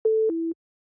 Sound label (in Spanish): PUNTO CIEGO; SIMUZENSOUND